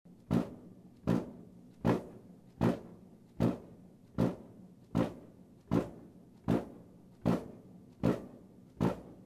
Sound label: Piling @ 500m; offshore wind farm construction; marine mammals